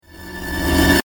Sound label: dramatic buildup